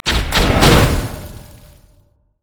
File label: ripple effect; nautilus